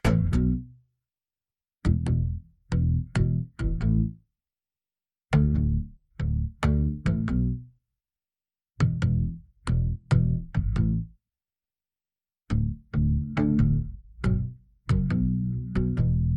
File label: Bass; Três patinhos foram passear